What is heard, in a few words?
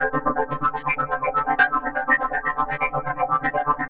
Analog Synth Pattern; Sonic Foundry (Sony Creative Software); Electronic Point Blank